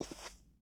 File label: Foot Carpet Shoes Walk; Lucius III; pc linux